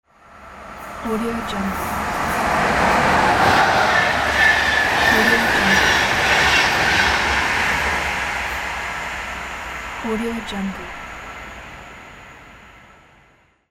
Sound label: speed train